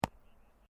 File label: Sport Ball Rubber Bounce Hand Grab, Rubber ball; Bounces; Objects